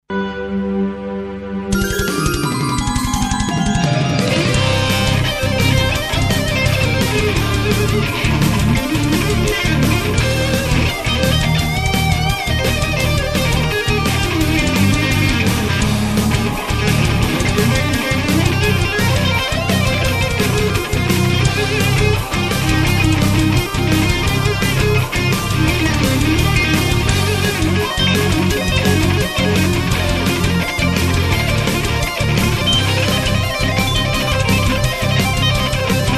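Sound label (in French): Trés beau BT néo-classique de guitariste de Solo trés shredd mais je me suis bien éclaté ...(Washbu; Backing track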